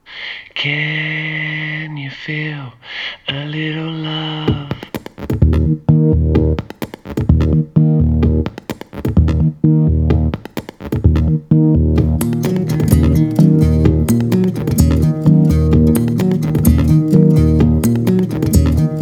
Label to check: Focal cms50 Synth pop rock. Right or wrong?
Right